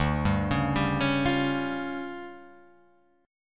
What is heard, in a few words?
D Minor Major 7th Double Flat 5th, D